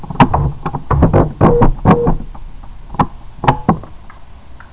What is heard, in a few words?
MACHINE V